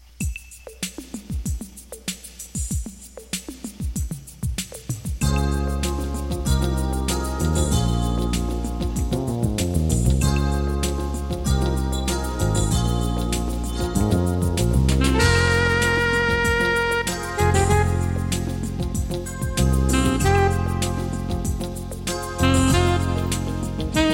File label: Sax